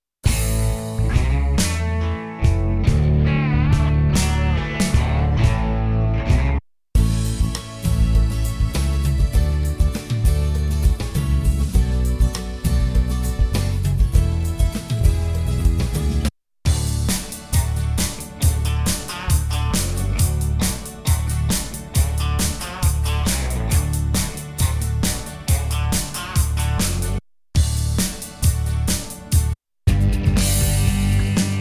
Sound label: Random Country